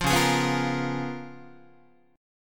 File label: Db 13th Guitar, Db13 chord; strummed; JGuitar